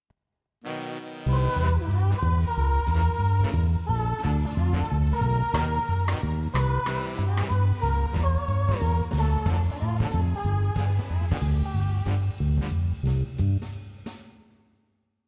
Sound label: French Dance